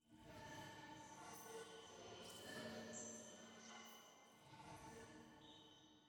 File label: Pad High